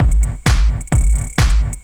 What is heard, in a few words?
drum 130 straight; Techno Trance Essentials